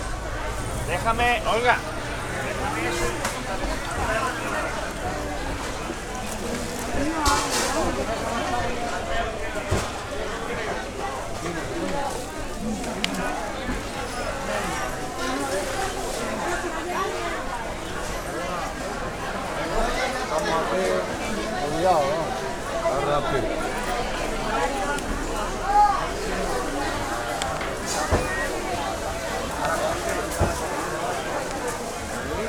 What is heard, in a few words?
market, Town; Loops